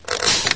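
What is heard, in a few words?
reload with an unknown crunch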